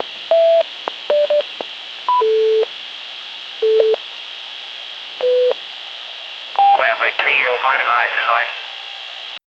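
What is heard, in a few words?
police walkie talkie click; communication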